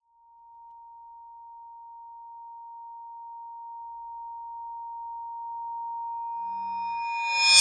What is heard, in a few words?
Swell Metallic Ring; bottlerocket